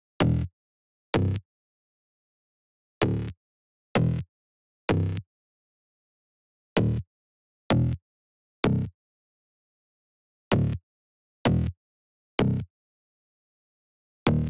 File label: 128 BPM E Min Bass; House; Drop Loops; Cymatics; Goliath